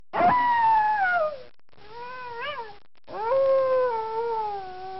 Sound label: Coyote Howling Ringtone